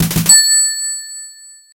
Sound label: cash register; Sonic